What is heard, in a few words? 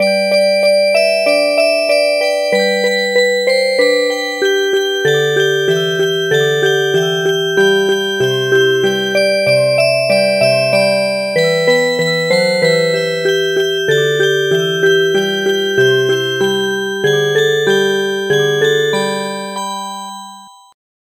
Music Box; Long I gaze across the snow